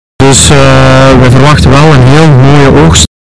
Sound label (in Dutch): geit